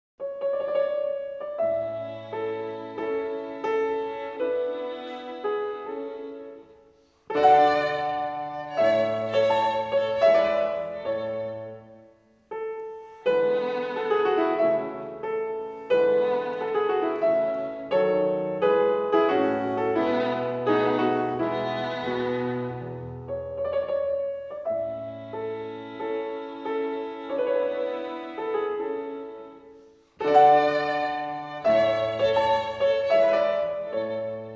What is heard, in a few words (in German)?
Violinsonate A-Dur - Tema, Andante Grazioso; Mozart; Chamber Music; Violin Sonatas